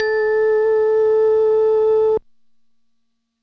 Yamaha VSS One Shot - A, Synth One Shots; Cymatics; Light Free Sample Pack; Bonus Samples

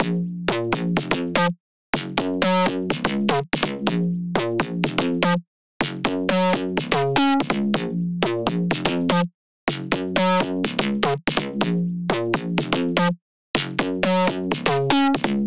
124 BPM A# Min Bass; Drop Loops; House; Cymatics; Reasons

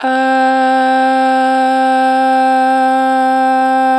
Long Ah - 2 bar vibrato, Vocals, Natural Vibrato; Harmor Vocals

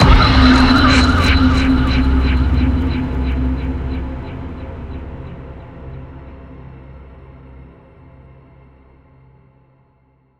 Forest Of Flames; Ghosthack; SkyRealm

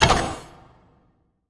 Attack Magic Arrow; Game Essentials

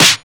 Snare, techno drum shot

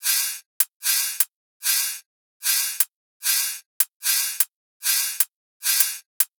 Dubstep Drum Loop Cymbals, 150bpm; Full Drum Loops (Stem Seperated); Ghosthack; Dubstep Sound Arsenal

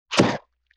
Gloop Hit; Vintage Cartoon; Hits & Tones

